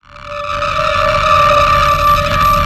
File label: light ray charge up; dogma